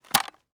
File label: grab, weapons m249; Urban-Coalition; Anarchy; arccw ue